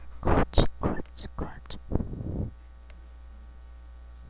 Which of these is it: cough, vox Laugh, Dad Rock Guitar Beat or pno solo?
cough